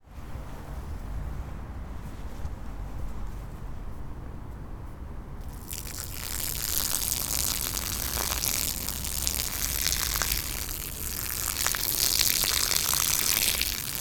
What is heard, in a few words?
Pee on grass